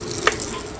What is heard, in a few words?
pour finish; Votive 1.21.1; Votive Content; votive cooking